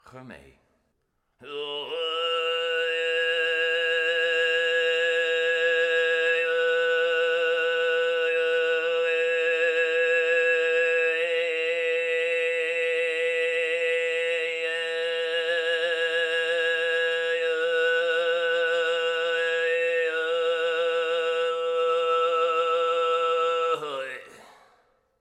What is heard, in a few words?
khoomei Style